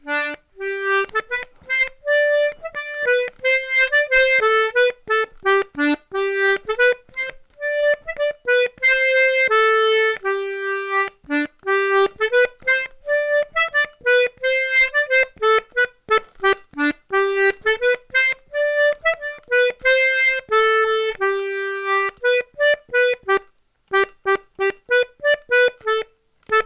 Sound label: polka